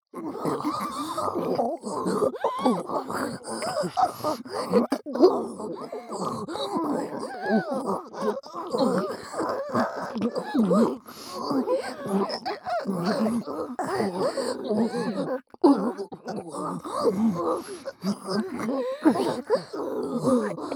オホ声; ミオ

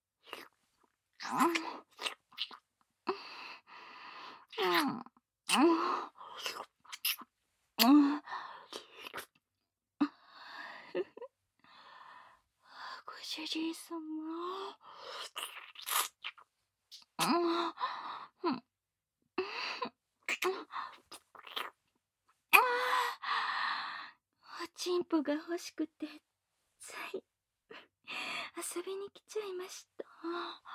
ディープキス 手コキ; アフター媚び媚び前戯; KU100

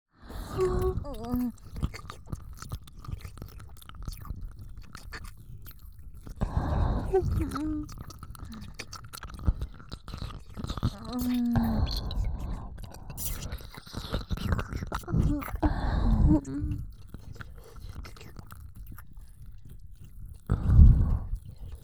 キス＆耳舐め; 人妻×痴女

